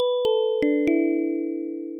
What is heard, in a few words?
grave jingle; gbjam12; jingles